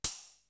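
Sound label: synth hat, cymbal; East West; Global Sounds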